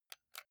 console controller xbox rb press slow soft

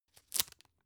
tissue open

